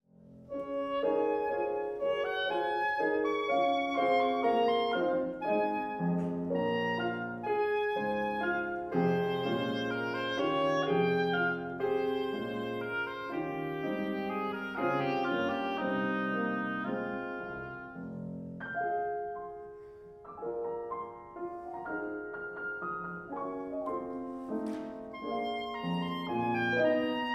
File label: Sonatine in F# minor, II. Mouvement de Menuet; Ravel